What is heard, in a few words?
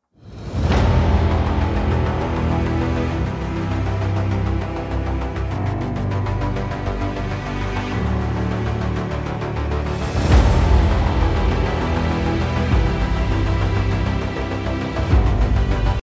Epic Cinematic Dramatic; MIUI12